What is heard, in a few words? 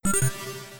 Notify; Kerbal Space Program v1.0.5; KerbalAlarmClock